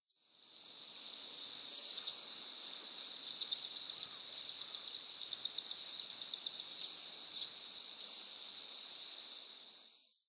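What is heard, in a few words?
Common Sandpiper; isoshigi; utsunomiya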